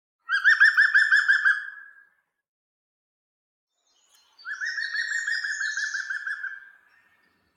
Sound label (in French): pic vert cris